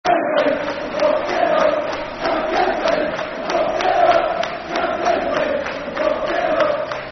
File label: Champions League Football Chant (Soccer Song); Bradford City Bcfc